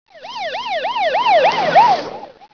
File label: SIREN